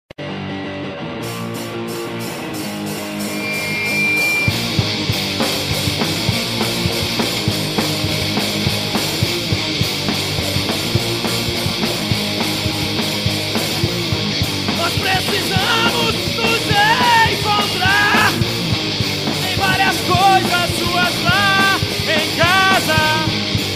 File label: cellos